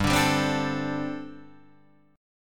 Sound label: G Suspended 2nd Suspended 4th, Guitar Open D